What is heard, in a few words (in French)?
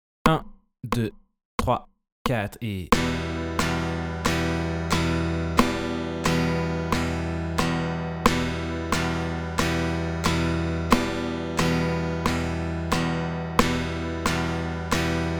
Temps en rythmiques rapide 90